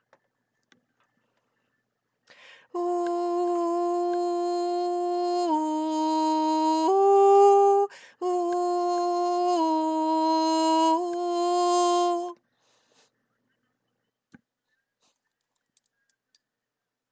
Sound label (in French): alto